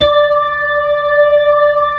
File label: B-3, Blues